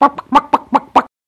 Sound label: chicken